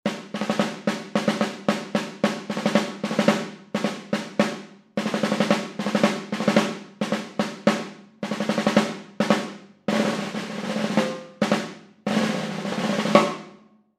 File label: Snare Drum Riffs